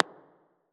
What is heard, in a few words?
Echoing Pop